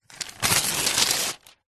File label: household book telephone directory page rip out; zapsplat